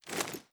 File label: ar reload rotate, weapons; gmod; viper